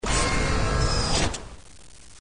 open door; openspace3d; kidkitchen

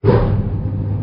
elevator start; openspace3d; FPSDemo

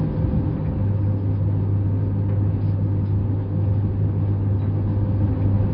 elevator loop; openspace3d; FPSDemo

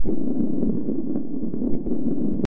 ball roll; openspace3d; aliencrash

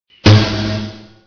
shock; openspace3d; fps navigation

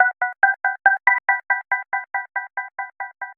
portal; openspace3d; oculus nation

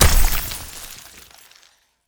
falling ice; openspace3d; aliencrash

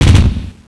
shoot, paintball; paintballav

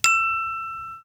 glass; openspace3d; kinectdemo

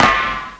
hurt; paintballav

